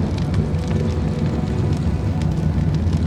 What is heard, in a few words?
flame guard loop; weapons; dota; ember spirit